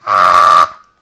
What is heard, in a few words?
mating call